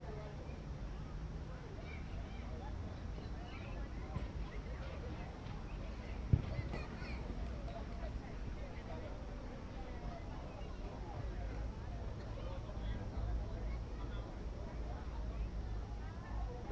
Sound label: Many People Distant